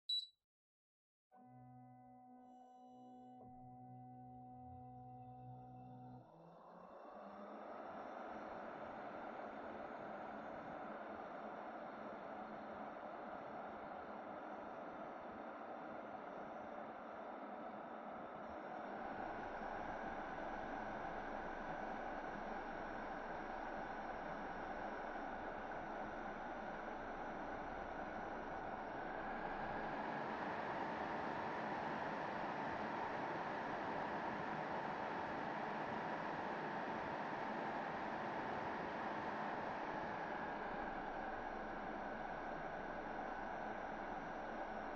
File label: Air Conditioner Far All